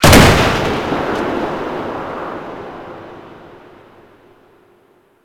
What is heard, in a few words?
Settlement Gun Fire; Ambience; Warframe; Grineer Settlement